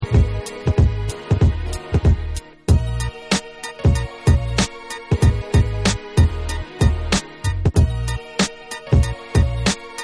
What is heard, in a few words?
Instrumental